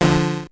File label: multiplier D4 F4